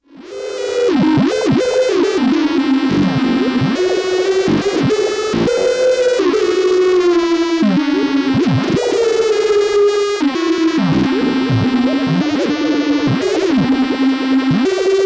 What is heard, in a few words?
Dreadbox Chromatic Module Aleatoric